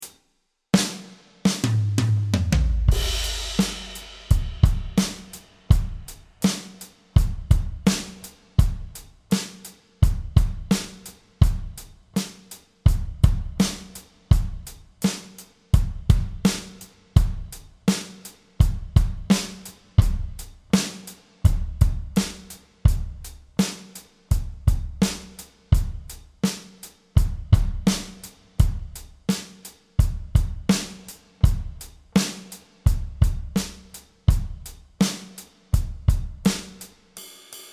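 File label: 84 bpm drum track winning pop, Played on Yamaha FGDP-30; Played on Yamaha Finger Drums FGDP-30 and rendered using the Apple Brooklyn sound set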